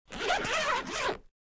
bag zipper

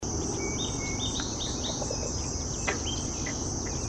Least bittern; پرنده بوتیمار کمینه

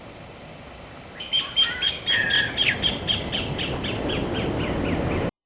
Pale legged hornero

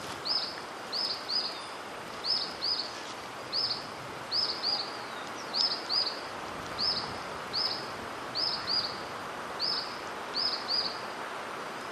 Rough legged tyrannulet

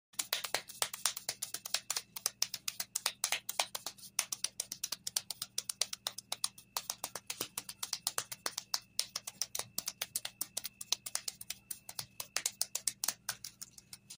Hitting stomach

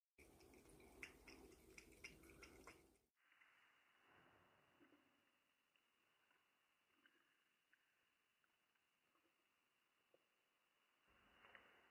cat drinking water; آب خوردن گربه

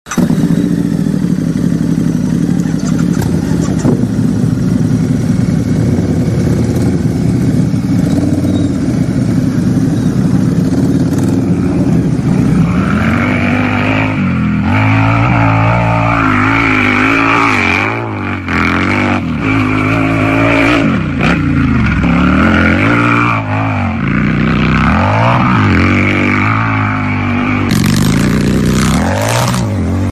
Harley Davidson Motorcycle

